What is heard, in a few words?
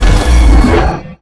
Goblin Mech swing; Bosses; Dungeon Defender; DunDef